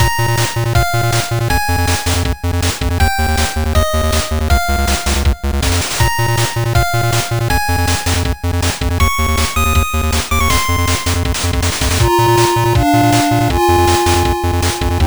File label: Upbeat Tune; Adventure in the Tower of Flight